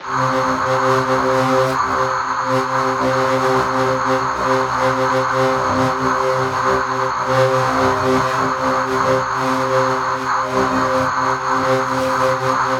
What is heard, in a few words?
textured atonal atmospheres; paragon